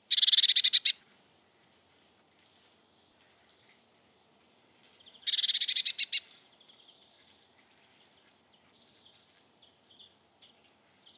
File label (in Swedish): blames Cyanistes caeruleus